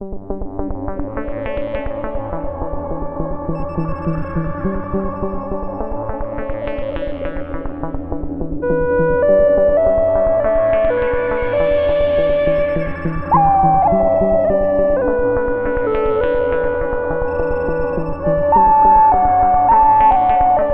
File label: Marimba Roll